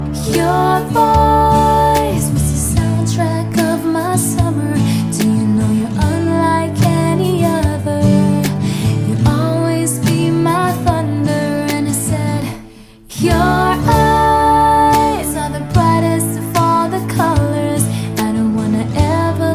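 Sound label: Acoustic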